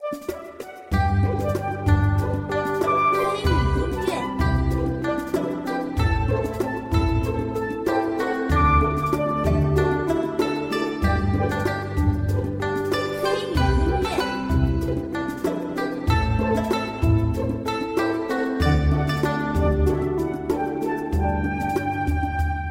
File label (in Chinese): 登录